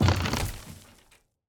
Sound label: car wood impact; factorio 2.0.55